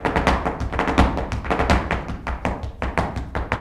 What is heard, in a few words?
Flamenco, ZAPATEADOSOL